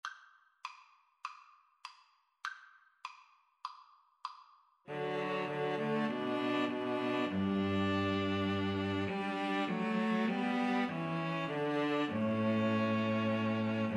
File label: String trio